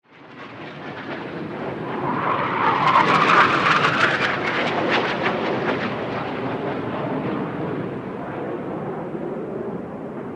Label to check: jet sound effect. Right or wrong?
Right